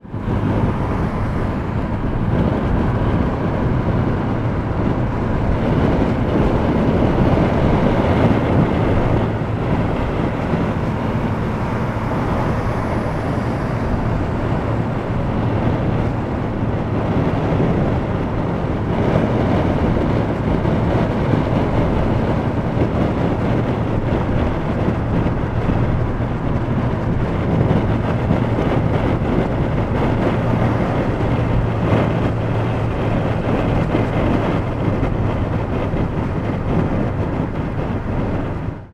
transport car motorway inside window open slight air cars pass; zapsplat